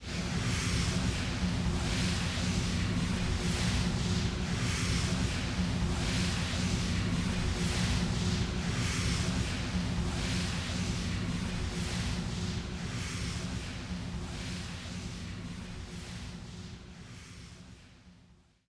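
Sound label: player movement slide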